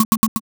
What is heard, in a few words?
locked door